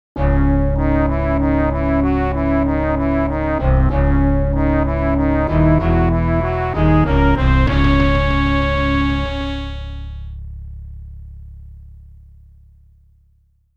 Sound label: Defeat Fanfare; nobunaga no yabou haouden; daikoukai jidai ii